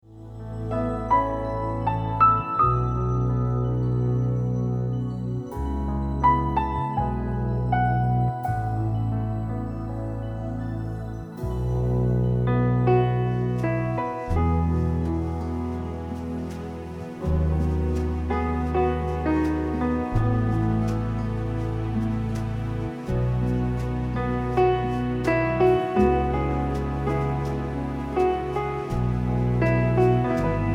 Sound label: instrumental